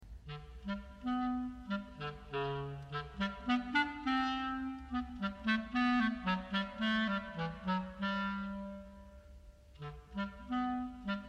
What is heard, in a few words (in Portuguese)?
clarinete